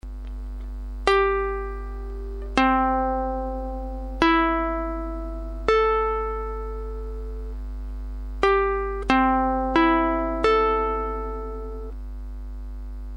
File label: high gcea